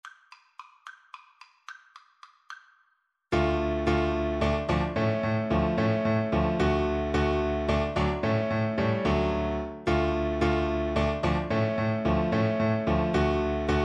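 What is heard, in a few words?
viola karaoke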